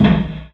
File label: Loop, Chamberlin Rhythmate